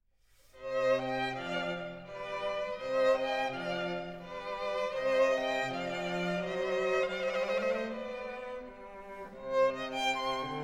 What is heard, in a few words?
Concerto In C Minor For Two Violins And Orchestra Largo; Rv 510 Ii